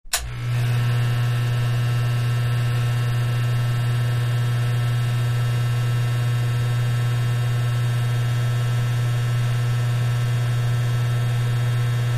Fan Bathroom Exhaust Old 2; On, Steady Close Up Hum, Off; Fans